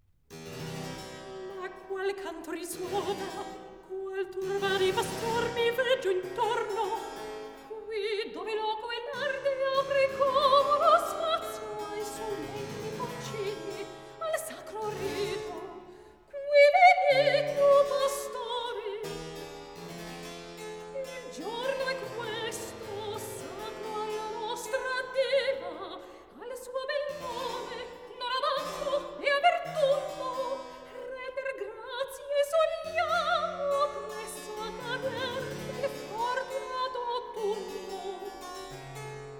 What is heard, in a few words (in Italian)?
Recitativo Ascanio & Fauno; KV 111 Ascanio in Alba 1771; Ma qual canto risona; Mozart; Operas